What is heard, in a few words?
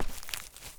earth, human step; Footsies